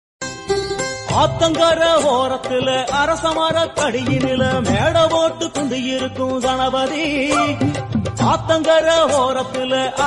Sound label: song tamil ringtone bgm music attitude bgm